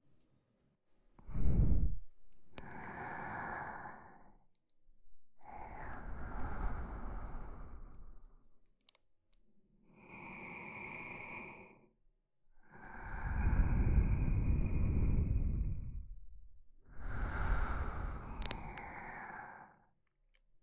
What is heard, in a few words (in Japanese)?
寝息×クチュクチュ×げっぷ; 両耳